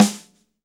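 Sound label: SD, snare drum; 90 s Sample CDs; Sound & Vision - Gigapack I CD 1 (Roland); Real-Kit m 7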